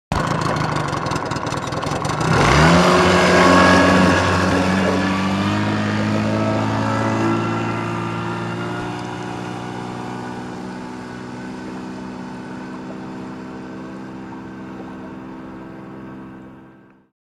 Boat with Engine; Away; Boat Away To Long Shot.; powerboat

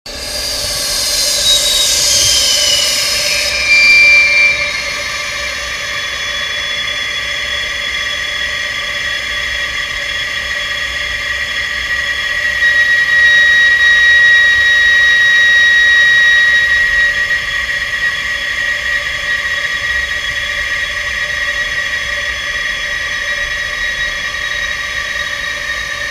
Air Valve Heavy Air

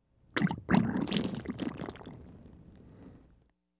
Alien Drips Large Rev, slime

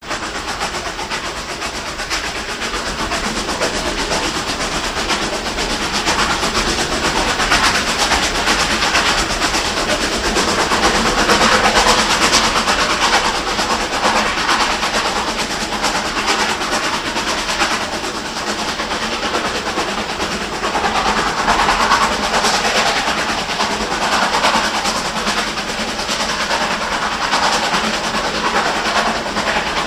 Steam Loco Daylight, steam train

